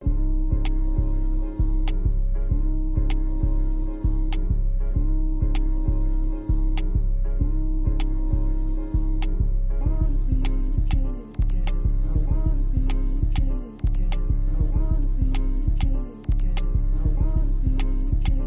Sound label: type beat; detroit